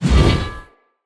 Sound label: Whoosh, Swords; Sub Boss; Weapons; Enemy; Mockery Swords; Stultion